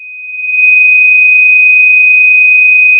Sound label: Sound of a tea kettle whistling